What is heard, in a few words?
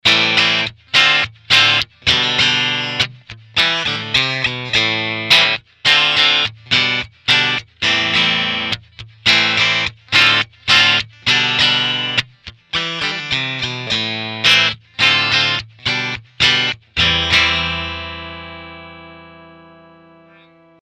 bridge clean; steel foundry; axetec